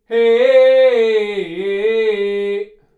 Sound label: Outside noBox Absorbing Test